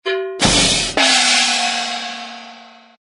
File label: bang crash gong